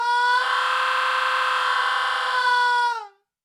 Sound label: woooooaaaaaaaauuuggh